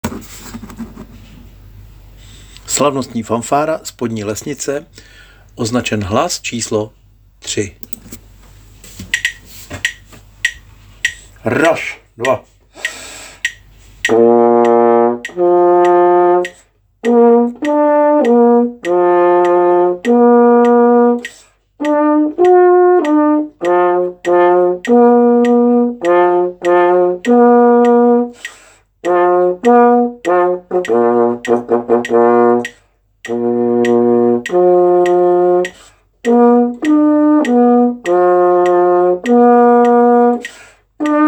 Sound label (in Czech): Slavnostni fanfara bas